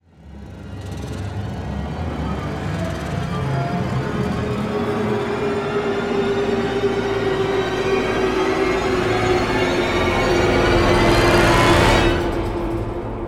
Gravity Riser; heavyocity gravity

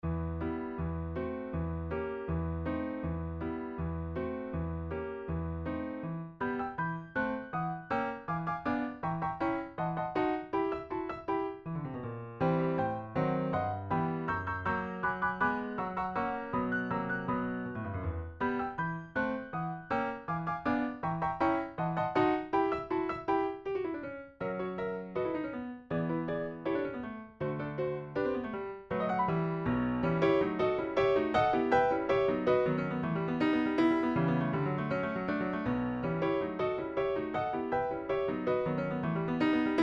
yamaha c7; zuckerfee

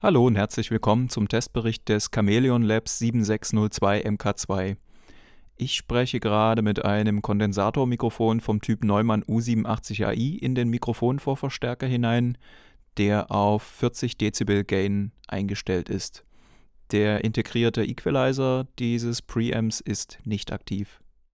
7602 MKII vocal dry; chameleon labs

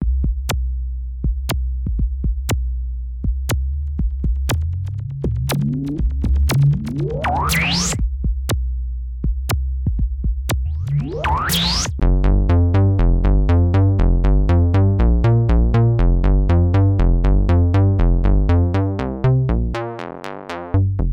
Bass, Pioneer Toraiz AS-1

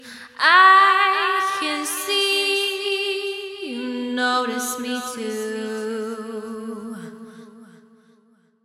VOCAL WET

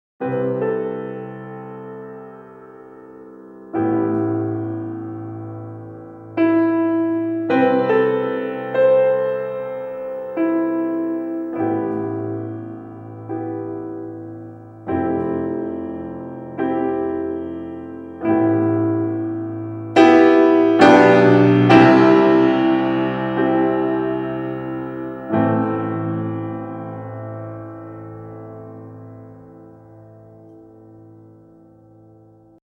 Orchestral Essentials piano mystique; ProjectSAM

